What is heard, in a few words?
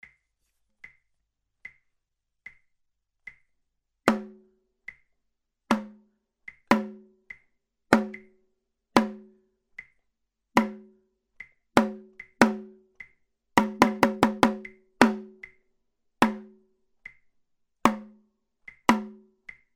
HIGH; LAPIAZ 2